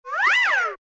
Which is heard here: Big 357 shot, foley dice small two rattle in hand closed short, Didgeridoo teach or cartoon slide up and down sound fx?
cartoon slide up and down sound fx